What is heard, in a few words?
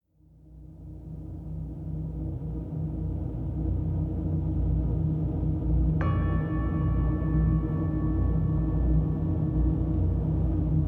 meditation music spa deep tibetan ambiance; mindfulness; balancing